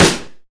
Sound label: noisy snare drum sample c key